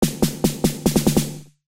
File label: snare